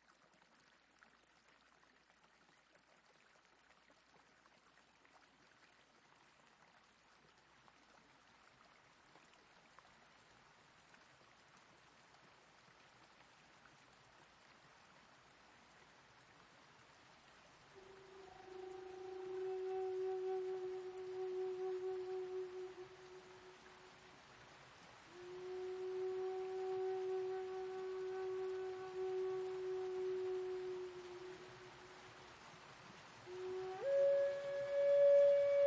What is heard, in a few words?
Loon Lake by Morning